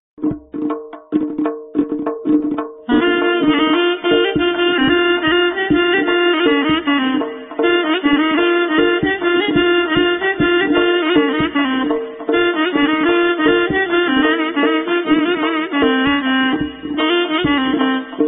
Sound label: Balaban; ساز بالابان